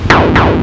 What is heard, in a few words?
laser